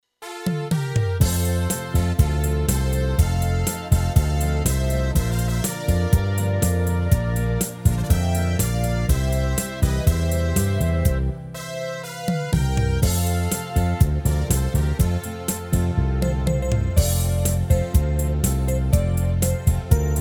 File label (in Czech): S harmonikou